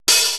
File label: 80S DRUM SET